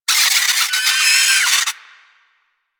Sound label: tranquillized; Warframe; Hunting Critters; Avichaea